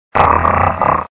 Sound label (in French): Cri